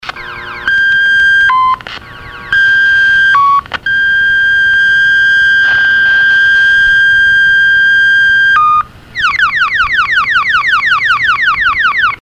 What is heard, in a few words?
plectron; Unknown low-band agency alert 1, G8 encoder